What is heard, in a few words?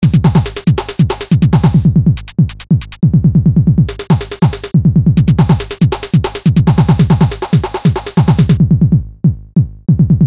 beat mix